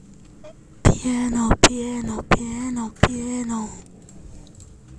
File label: piano